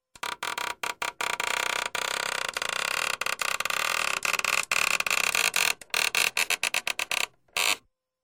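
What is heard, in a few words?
door creaks; Skripy dvernye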